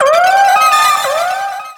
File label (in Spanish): Grito; Lumineon